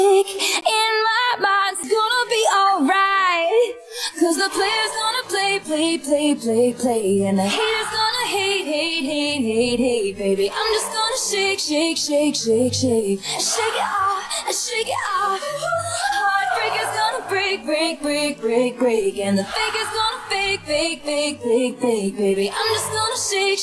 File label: Vocals